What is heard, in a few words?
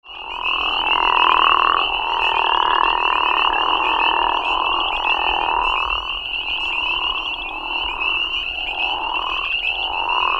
Crawfish Frog Chorus